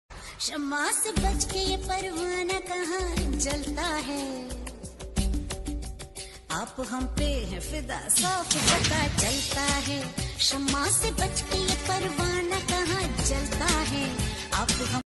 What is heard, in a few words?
Romantic Hindi Song Ringtone